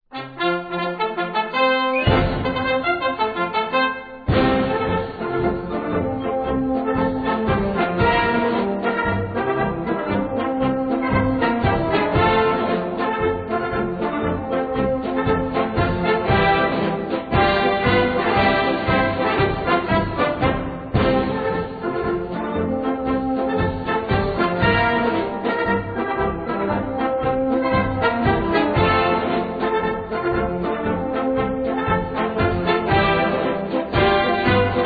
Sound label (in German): Marsch